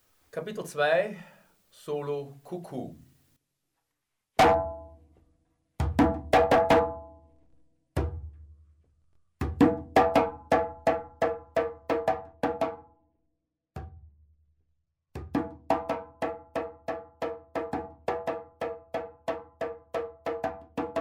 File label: Solo kuku, djembe; djembe aufbau